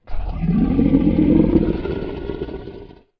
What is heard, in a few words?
Gold Dragon pretty big; SyrinScape; Vault of Dragons